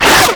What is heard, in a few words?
Infested Runner Arm Attack E; Enemies; Abilities; Warframe; Proto Infested